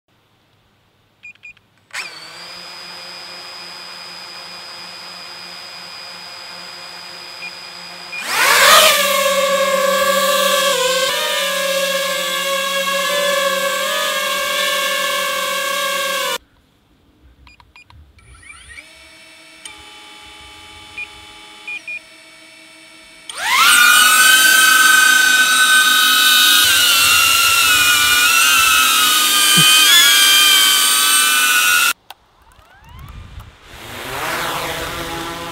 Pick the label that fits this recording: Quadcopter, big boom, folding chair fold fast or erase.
Quadcopter